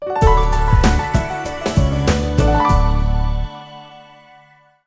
Sound Sting

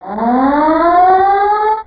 Lock; BZFlag; Action

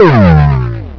gameover

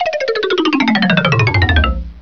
fence; Broderbund Demo

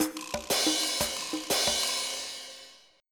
SHUFFLE

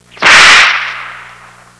whip; Sound Effects for Multimedia - Volume 1

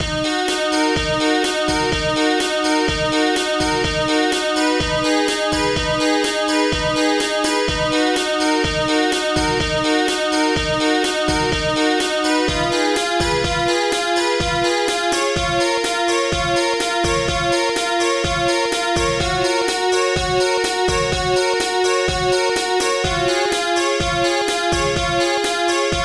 duo bat synth

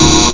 breath chord; Instruments

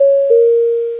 doorbell; QUACK

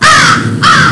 Raven, jingle; Amiga 8-bit Sampled Voice

